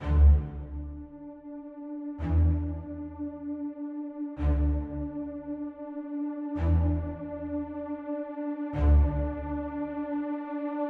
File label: Drums Bass and Strings Only